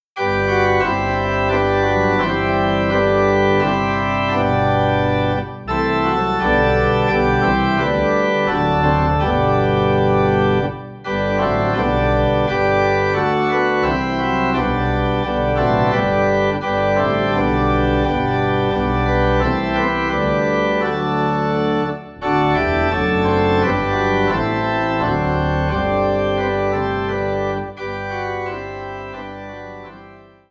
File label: Organ Low in G; christmas; While Shepherds Watched Their Flocks